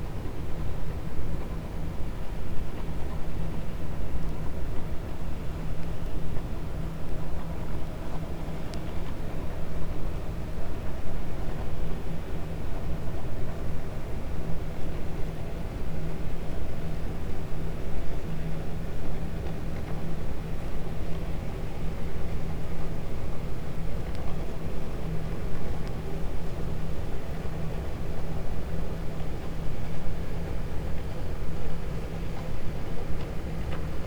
cachalot negatif, Physeter Macrocephalus

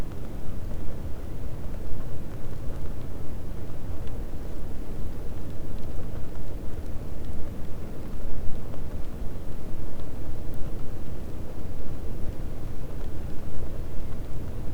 cachalot negatif, Physeter Macrocephalus

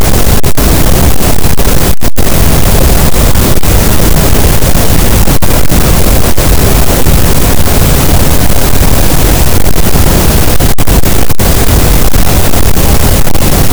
cachalot, Physeter Macrocephalus, gaussian noise

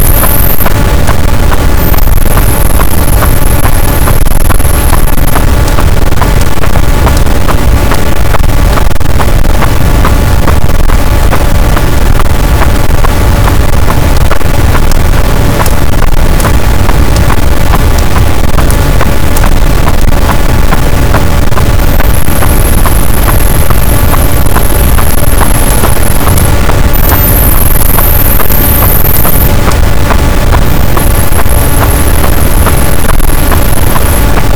cachalot positif, boat, Physeter Macrocephalus

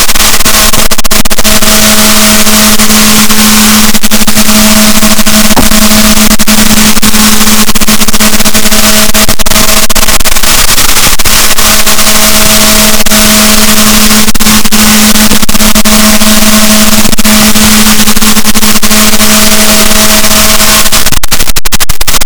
rorquals negatif, Balaenoptera Physalus; boat; SNR -6dB

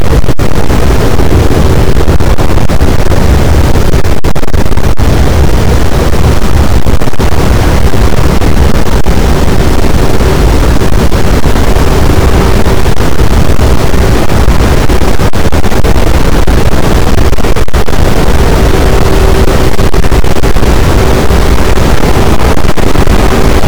cachalot, boat; Physeter Macrocephalus

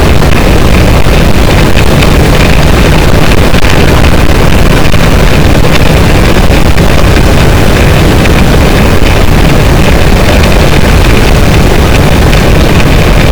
cachalot, boat; Physeter Macrocephalus

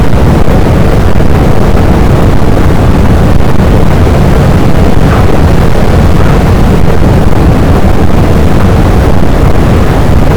cachalot, boat; Physeter Macrocephalus

